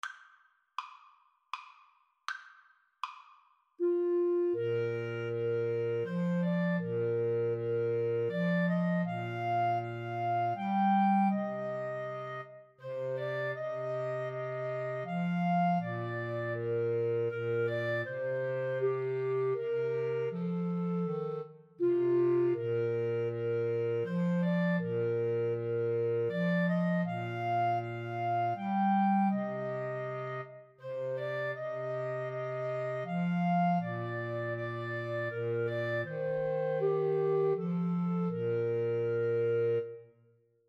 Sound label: Clarinet Trio